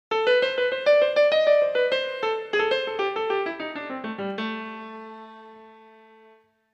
blues

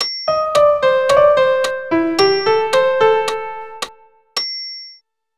Eighths

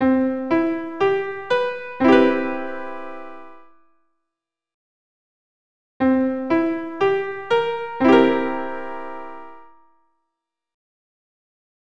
7th Chords plus, keyboard

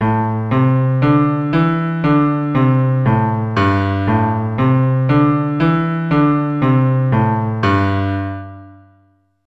Walking Bass, keyboard

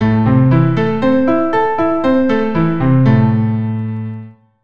Am Arp 100, keyboard

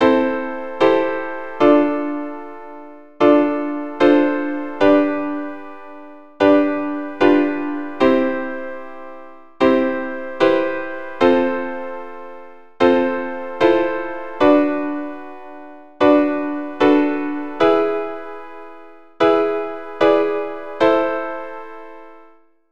Circle or 5ths minor Diatonic, keyboard

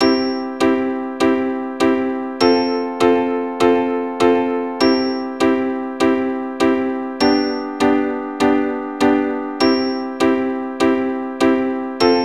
C F C G, keyboard

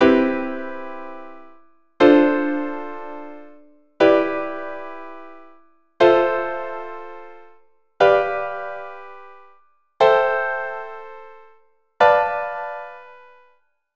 Chord construction, keyboard

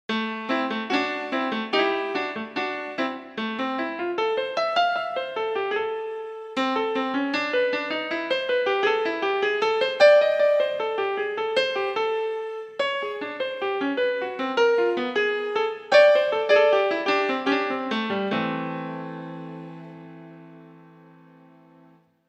chromatics

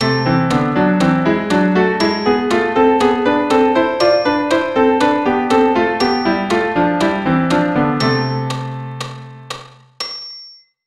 Am melodic

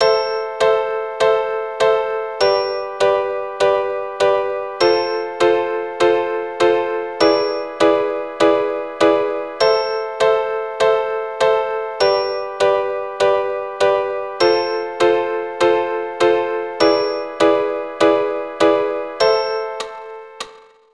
Am G F E7, keyboard

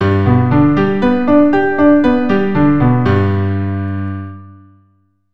G Arp 100

